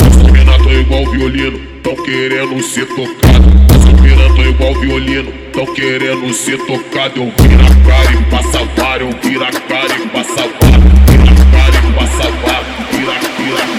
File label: Phonk Music; فانک phonk